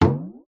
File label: cartoon hit impact rise pitch drum like